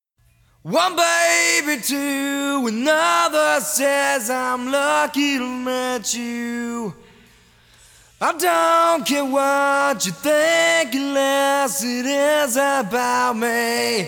vocals

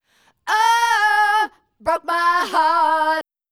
Vocals

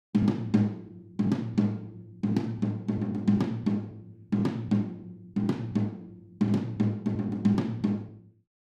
Room Tom

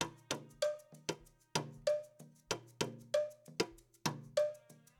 Kit Intro

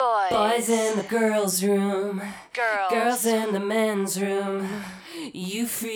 Vocal

